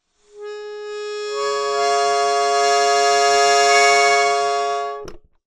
Clusters, Melodica

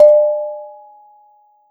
Bonang P, Gamelan

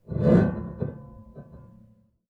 String Rub Erratic, Piano; Piano Miscellaneous

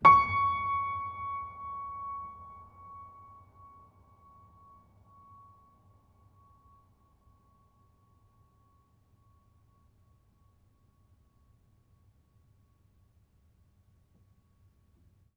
Note, Piano; Piano Miscellaneous

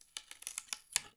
Palette Tear, Wooden Palette

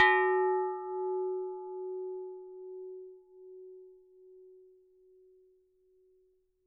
Struck Vase

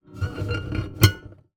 Classical Guitar Extended Technique

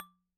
Bottle Struck

